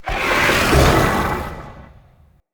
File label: Corrupted Battle Dog Shield Charge Growl; Animals; Vocals